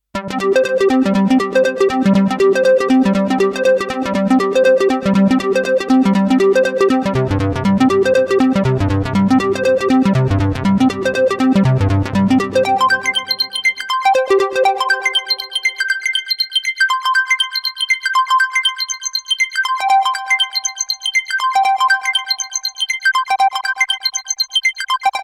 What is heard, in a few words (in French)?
minimax arpege; plugiator